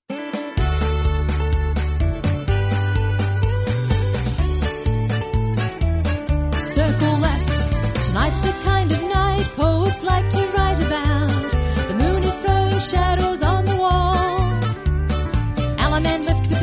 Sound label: vocal